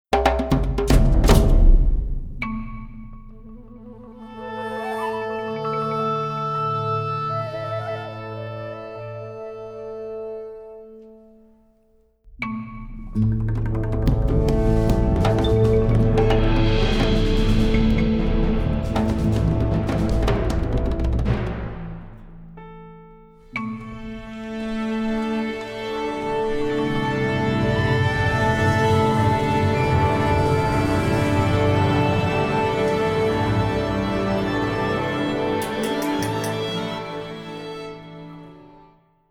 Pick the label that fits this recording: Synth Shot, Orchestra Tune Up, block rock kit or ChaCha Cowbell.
Orchestra Tune Up